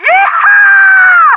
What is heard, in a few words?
player yeeehaa; allegiance